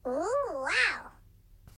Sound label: Ooh Wow; Cash inc